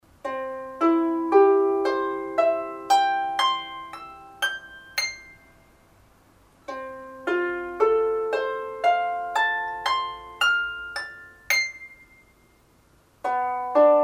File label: harp; amemori